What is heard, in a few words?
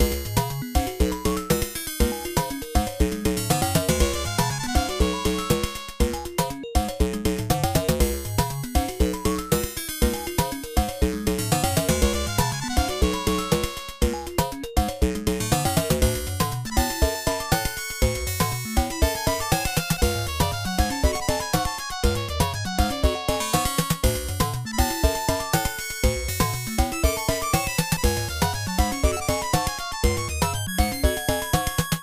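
background music; Block Block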